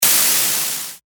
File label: Noise, percussion; revealed percussion vol 3